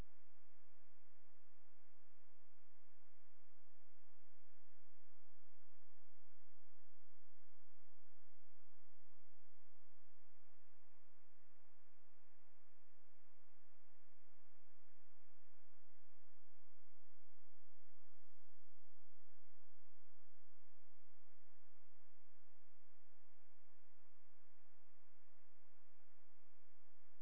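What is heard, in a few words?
hydrophone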